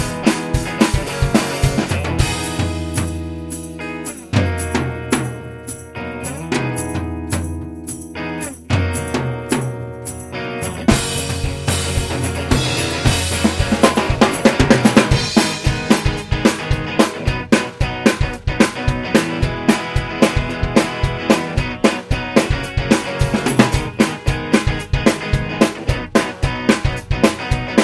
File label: solo